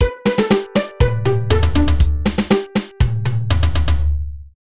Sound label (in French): carillon